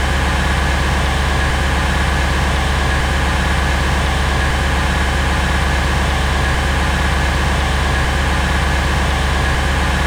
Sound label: car engine run loop; Stellaris